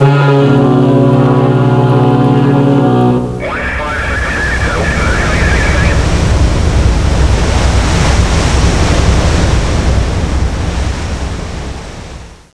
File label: warship arrive; zs rendezvous